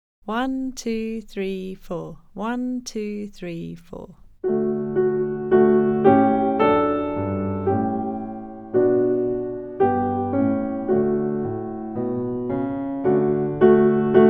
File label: Au Clair de la Lune D backing